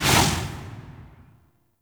Operator Focus Cloak Blind Burst; Warframe; Operators; Powers; Attack

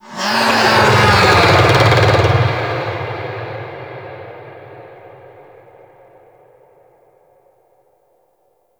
Infested Golem Vocal Attack Growl; Warframe

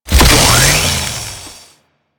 Saw Attack Rev Heavy, Melee Weapons; Warframe; Grn Ghoul Saw Player

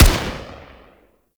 Arch Long Rifle 3D Fire, Weapons; Warframe; Archwing

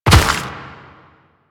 Step Dirt, Footsteps; Warframe; Quests; The New War; Kahl

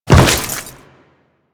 Step Goo, Footsteps; Warframe; Grineer

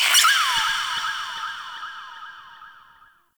Hallow Spirit Vocal Death; Halloween

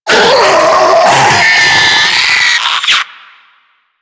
Infested Diseased Ancient Death; Warframe

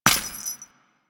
Step Default, footsteps; Warframe; Grineer Royal Jester; Foley

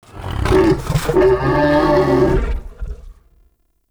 Stag Vocal Pain Idle

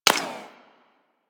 Bullet Impact Ricochet; Warframe; Weapons

